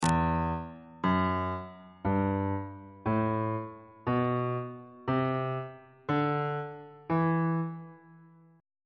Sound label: f flat aeolian mode on bass clef